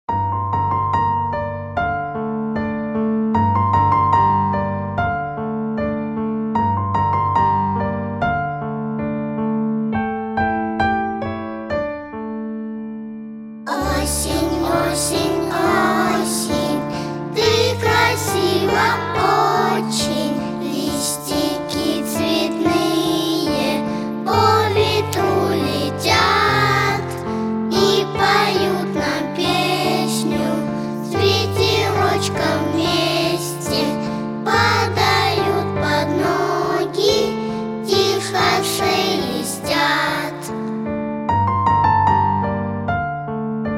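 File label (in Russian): vokalnyy ansambl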